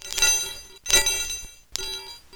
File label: chime